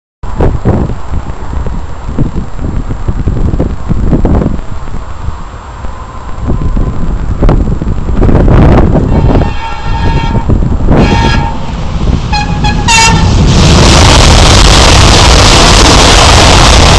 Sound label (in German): ICE3 Makrofon